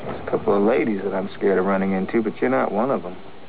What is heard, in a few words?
scared